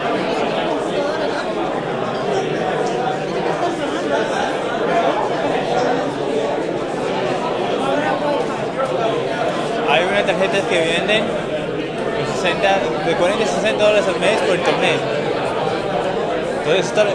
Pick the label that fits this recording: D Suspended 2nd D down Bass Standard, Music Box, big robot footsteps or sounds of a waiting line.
sounds of a waiting line